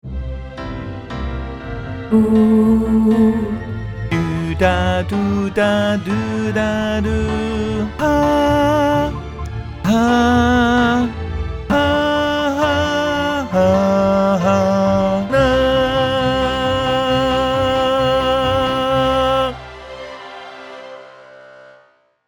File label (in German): Tenor; Sei hier Gast